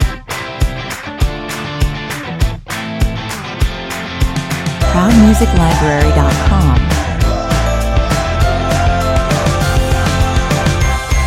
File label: upbeat rock stinger